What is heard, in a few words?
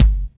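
BD, bass drum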